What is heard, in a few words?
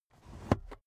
car headrest full adjustment